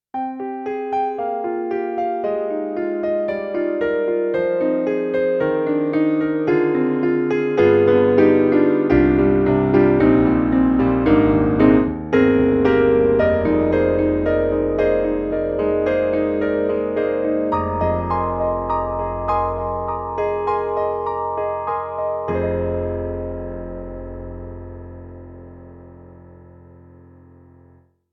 Kurzweil MicroEnsemble ME-1 Dreamy Piano

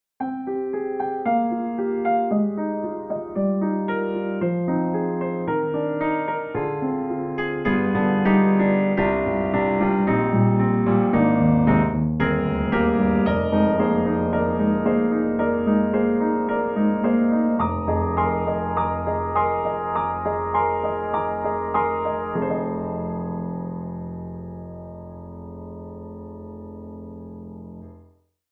Epic Darkness; Steinberg; HALion 6

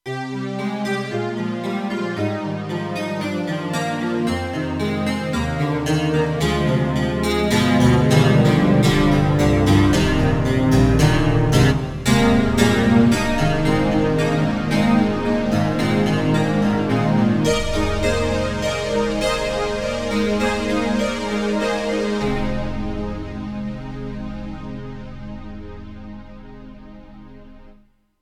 Korg TR-Rack Chordwork

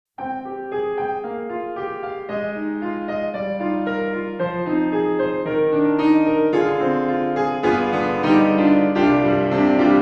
Piano Room Mic; Cinematic Studio Series

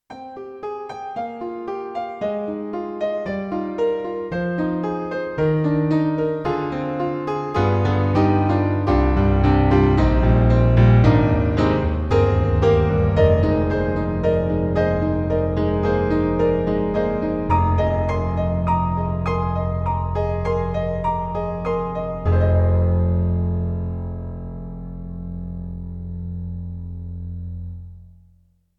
Acoustic Piano Dark Grand; Alesis NanoPiano

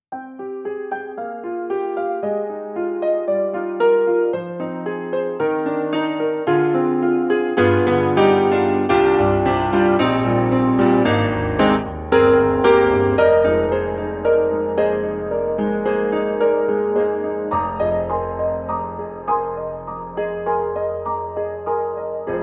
KORG KRONOS 2 Piano

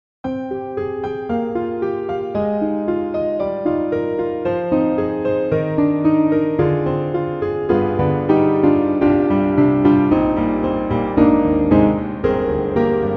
Grand Piano Soft; reFX; Nexus2

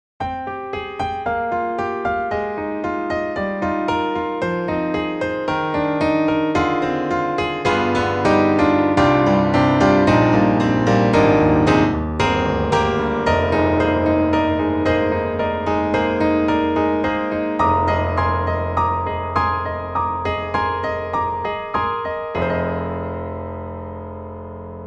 Truepianos Amber Wide; 4Front

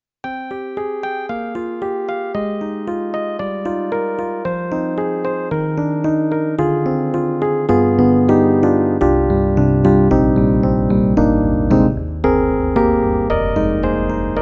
YAMAHA MU90 Electric Piano

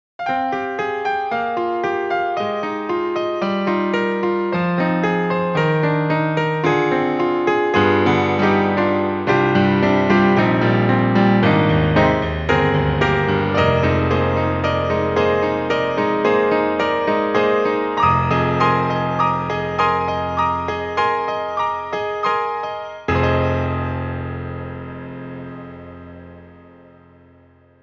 Stereo Grand Piano; SoundFont2; Hammersound

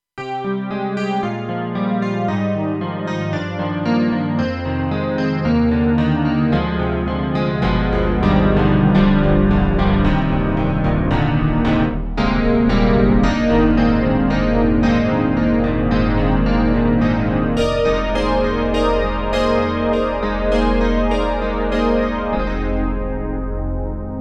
KORG TR-Rack Super CP Layer

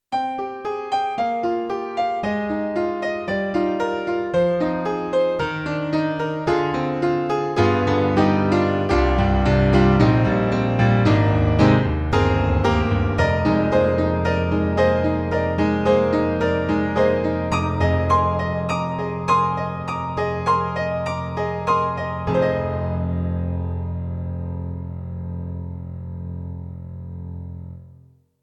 Alesis NanoPiano, Acoustic Piano, True Stereo

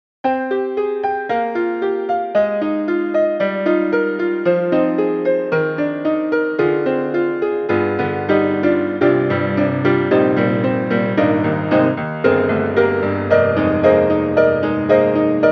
Backroom Old Joanna; Steinberg; HALion 6

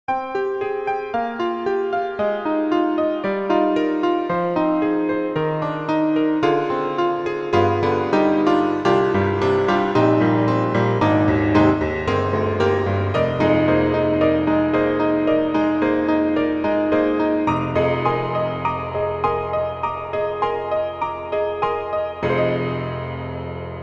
Vintage Piano Verb Piano; GoldVerbPiano

